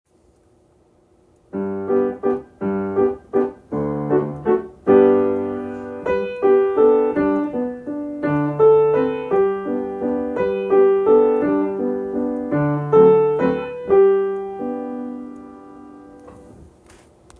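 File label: Big Ben 2, Piano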